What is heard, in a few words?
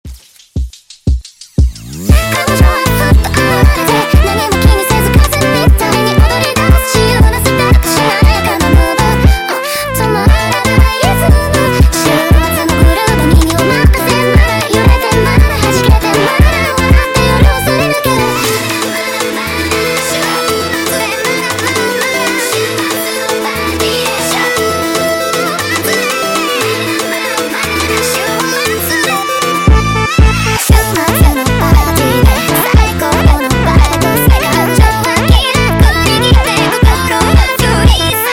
sped Up; آهنگ فانک